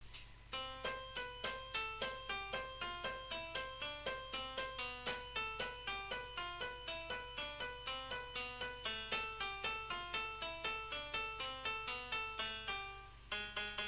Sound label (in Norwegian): melodi